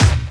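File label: snare drum bass drum, Dance Mega Drums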